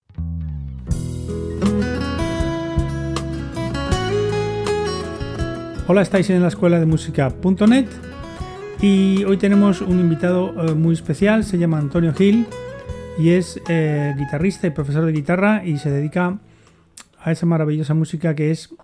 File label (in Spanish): Flamenco, guitarrista flamenco